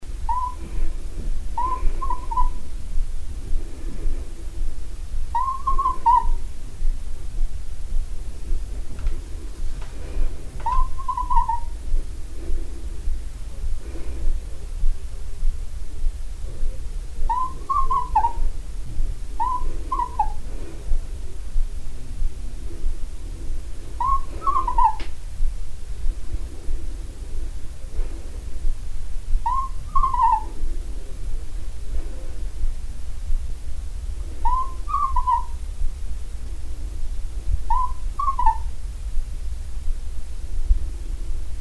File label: soft call